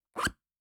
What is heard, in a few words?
Zipper